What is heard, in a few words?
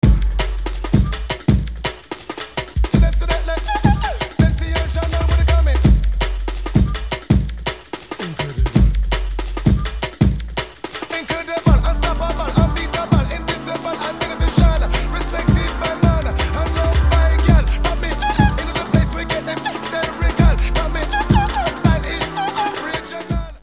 jungle